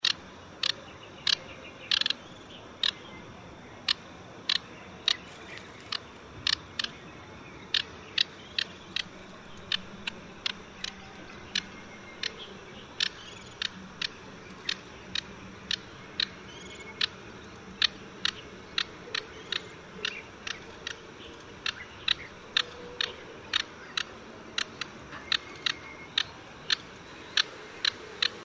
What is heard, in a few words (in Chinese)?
遠東樹鶯; 樹鶯科/冬候鳥